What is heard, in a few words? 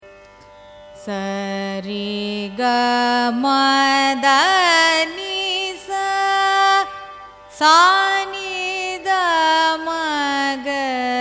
raga hamsanandi arohanam avarohanam, carnatic music